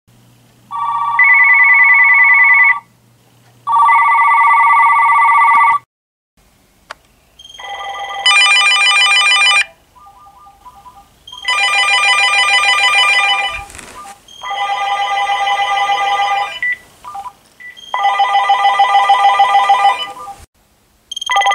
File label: Phones Ringing